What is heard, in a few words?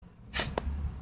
shoot; Teemos Dart Shooter Cosplay Prop With Arduino